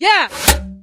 bazooka dep

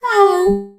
hurt vo

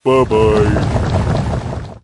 bowl atk

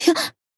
Firewatch tap

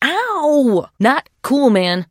trader pain respond; 七日杀; 商人杰恩

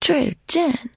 trader jen singing, 七日杀; 商人杰恩

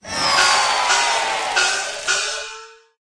Emote Lion Dance

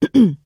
trader clear throat; 商人杰恩（七日杀）

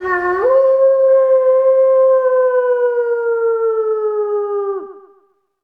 Dog howl

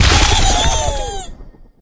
flea egg explo

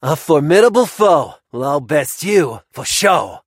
hurt vo

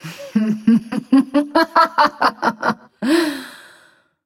laughs; Camille

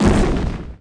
kaboom; CNCRAR

